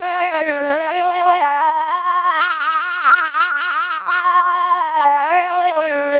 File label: siren, vocoders lowrate; TWELP 300 bps